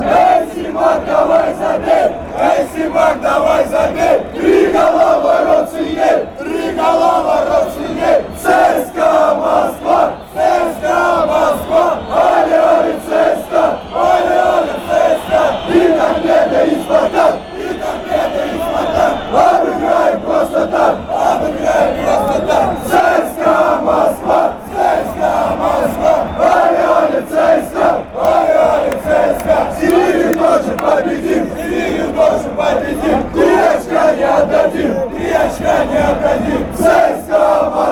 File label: cska chant